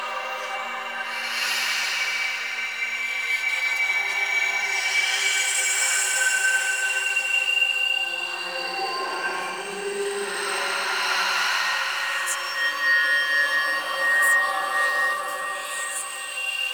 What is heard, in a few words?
Hand Compass Flying Loop; Warframe; Ambience; Duviri